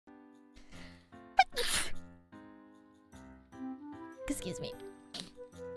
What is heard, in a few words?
Sneeze